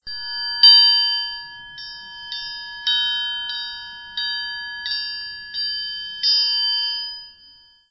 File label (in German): triangel